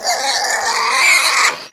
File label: mtushkano death; STALKER; customnpcs